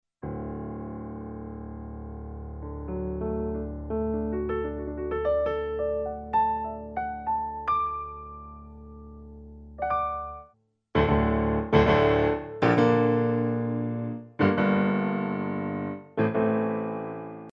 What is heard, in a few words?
numaconcert piano